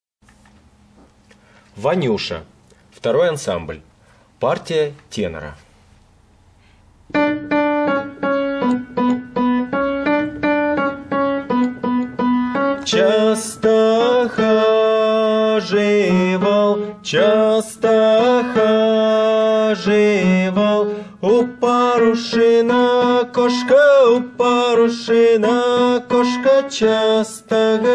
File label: Vaniusha tenor, ruschoir